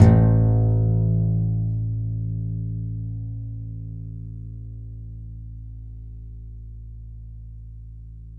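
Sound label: contrabass balalaika, Plucked Strings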